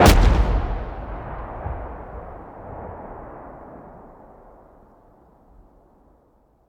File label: APR2 Fire